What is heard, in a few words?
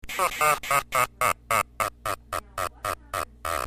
laugh; impossible mission